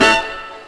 HORNHIT; E-Lab; X Static Goldmine 2; akai

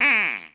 PERC

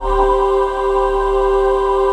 VOICE PAD, ATMO PADS; Best Service; XX Large

